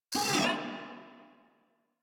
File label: Vocal Pain, Enemies; Warframe; Moa